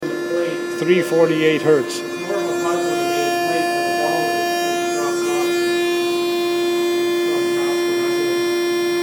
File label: kicker sound clip 348Hz